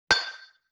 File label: Xylophone Clank G; Vintage Cartoon; Musical Elements